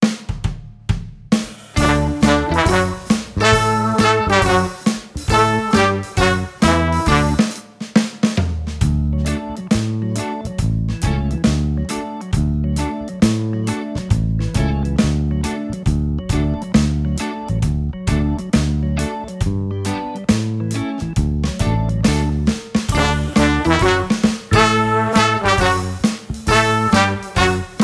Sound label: karaoke version